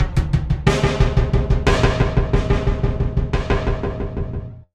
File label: 90 bpm beats